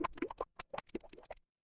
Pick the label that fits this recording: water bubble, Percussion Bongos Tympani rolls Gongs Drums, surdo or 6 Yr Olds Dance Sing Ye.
water bubble